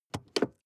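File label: sports car interior handbrake release medium; verkehr-transport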